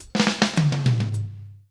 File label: Fills 103; Zero-G; Monster Beats